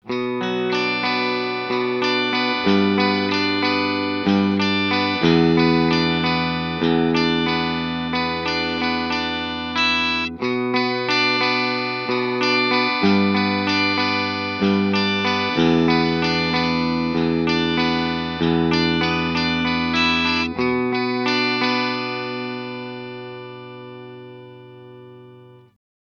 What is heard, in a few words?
EHX Pico POG Octave up HPF